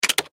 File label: Button Switch